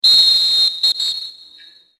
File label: whistle; csgo